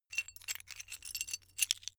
metal pieces clatter

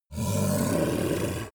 yeti growl warning; kreaturen

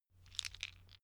stone gravel clatter soft; natur-tiere; steine

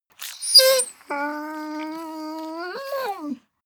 dog whine low sigh; natur-tiere; hunde

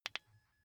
car key button click signal fast

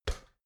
step ladder metal single; schritte

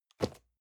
cardboard box medium place soft; objekte

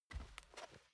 step barefoot wood plank sneak single right; schritte

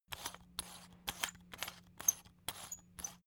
salt mill squeak soft sequence; berufe; gastronomie

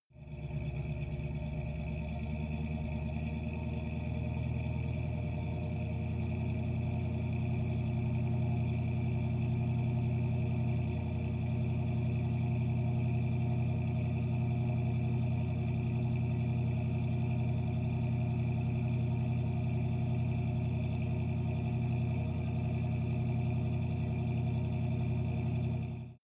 car camaro idle faster exhaust

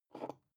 glass pick up smooth soft; objekte

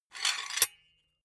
silicone gun empty pusher push fast; soundpool

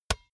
basketball dribble tap; sport freizeit

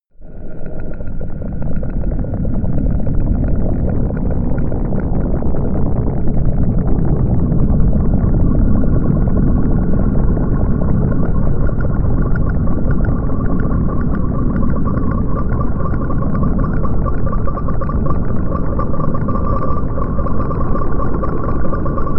drone bubbling

